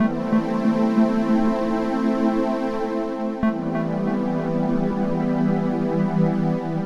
Pad; Dance

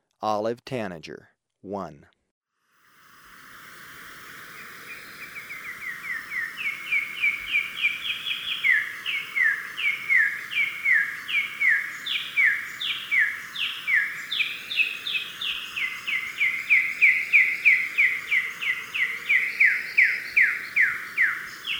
Olive Tanager, Birds of Ecuador; S. America

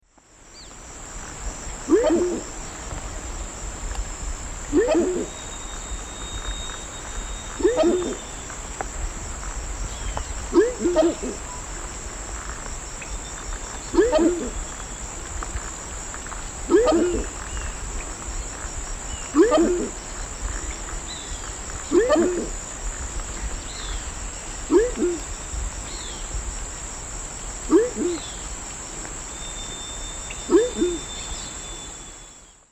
Anhima cornuta, call; S. America; Eastern Lowlands

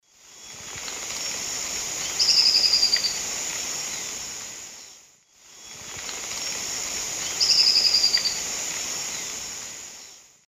Chelidoptera tenebrosa, song or calls; S. America; Eastern Lowlands

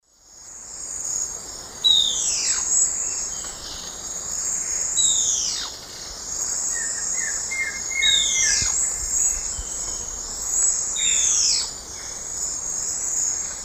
Phlegopsis nigromaculata, call; S. America; Eastern Lowlands

